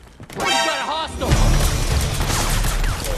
enemy discover with battle sound

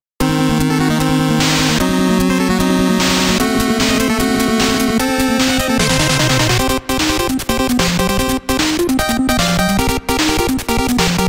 mmsoundtrack; Mega Man 2; gamesoundopedia

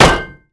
wood light bash metal; Warcraft III Frozen Throne

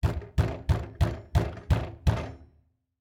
knocking unmuffled; pineview drive

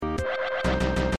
damage dealt; e.v.o. search for eden

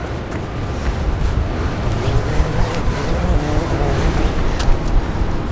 flying fox; mirrors edge

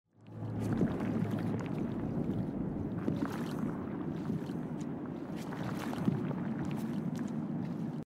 soundscape; gris

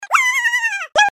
panic; Pikmin 3; gamesoundopedia

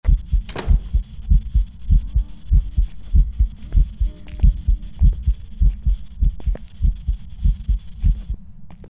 ghost activety; Phasmophobia; gamesoundopedia

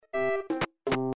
walking; e.v.o. search for eden

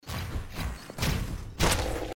hitting enemy; hyper light drifter

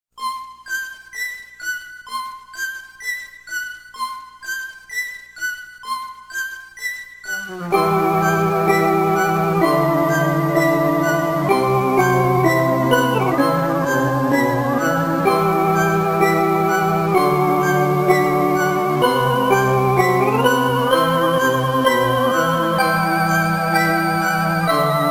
theme; Pokemon Red Blue; fire red; lavender town; gamesoundopedia

